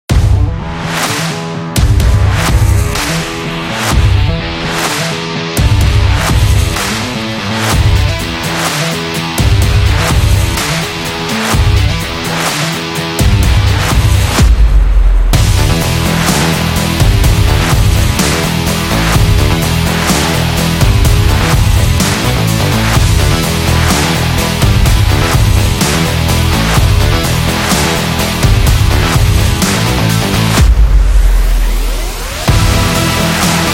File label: Sports Rock